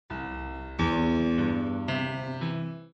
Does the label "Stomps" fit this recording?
No